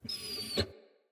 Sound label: Wolf Cub Trapped Call Close; Animals; Wolf Cubs; Orengal Wolf Cub C